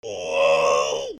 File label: scream, zombie screams